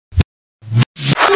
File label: Percussion; Club Amiga de Montreal - CAM; Instruments